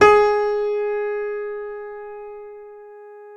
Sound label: PNO FF; Synclavier Sampler Library